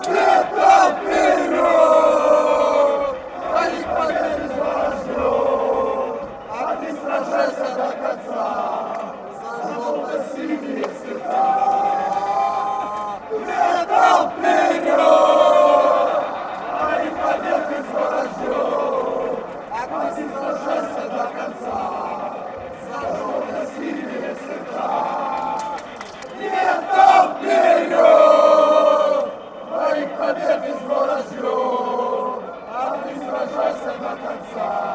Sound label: chant, fanchants, ukraine; metalist